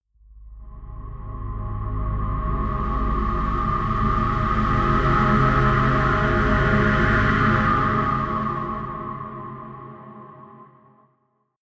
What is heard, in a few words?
deep atmospheric drone wind; musikelemente